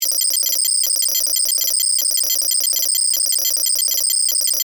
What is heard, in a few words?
Data Hard Drive Beeps, Readout, Scifi; Science, Fiction; Tech Sound Effects; Blast Wave